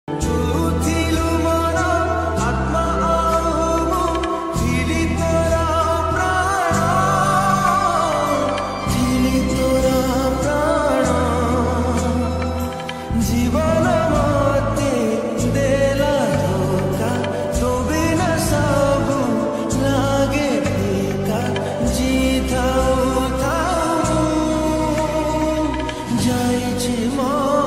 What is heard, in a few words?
Odia Jagannath Bhajan